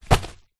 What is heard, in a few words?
parcel large padded envelope down on floor; foley